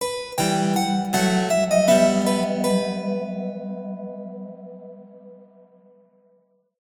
Music Bourree

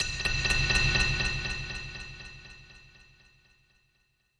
BGM stinger, BGM Player Dying Out; Babylon's Fall

